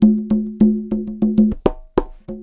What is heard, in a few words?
Conga Loop (100BPM); musicradar